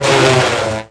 woodchipper chipping; Tony Hawk's Project 8; XBOX

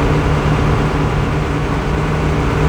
port equipment, ambience; hongkong; Soldier Of Fortune II Double Helix; XBOX

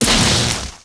explo droid, impacts explos

